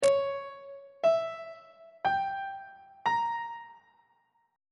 d harmonic minor 7th chord 7 is c sharp diminished 7th on treble clef